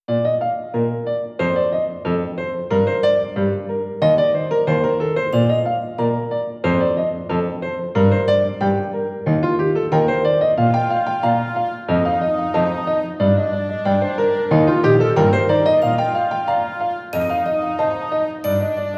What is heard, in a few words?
Joy, ringtones; China Telecom